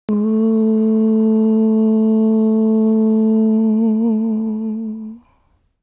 Vocal One Shot - A; Cymatics; Light Free Sample Pack; Bonus Samples; Vocals

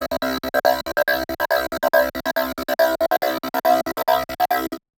Lead; Leads

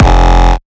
kick oneshot monster F; GhostHack

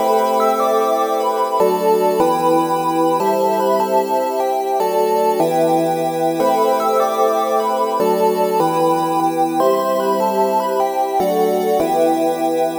Melody E 150, Melodic Loops, Color Bass; Wonderworld; Ghosthack - Color Bass Essentials

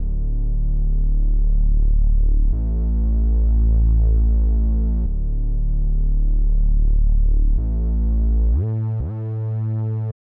95 BPM D Min Reese; Melody Loops; Loop Stems; Cymatics; Space

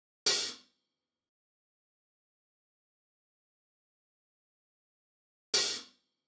Drum Loop - 91 BPM Open Hat, Boom Bap; Dreamers; Cymatics - ANALOG EVOLUTION Beta

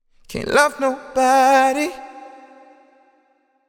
Sung Phrase Wet Love Nobody 150 BPM G Min; Cymatics; Euphoria Vocal Sample Pack

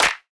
Future Bass Clap; Drums; One Shots

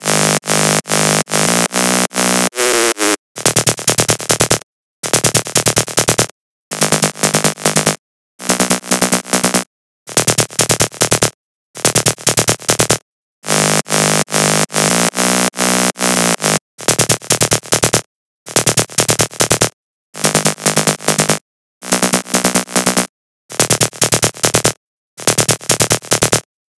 143 BPM D# Min Bass; Melody Loops; Loop Stems; Cymatics; Hyperbass Pt 2

